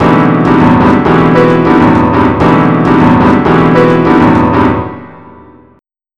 BOSSA; BUSKER L

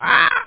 CAW; airTaxi

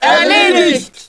wind Balloon Pickup; german

